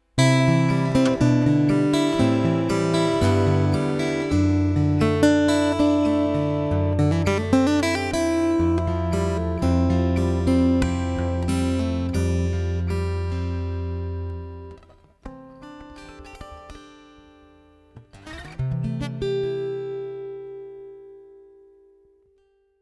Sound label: warm piezo